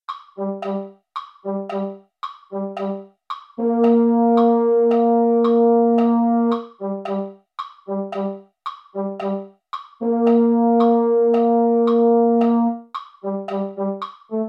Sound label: baritone; holiday brass